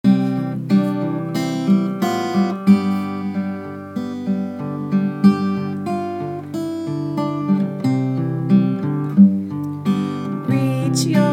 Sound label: Stretching song